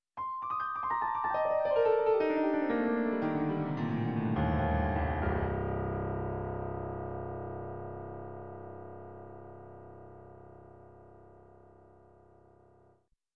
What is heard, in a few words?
Elegant Piano Scale Down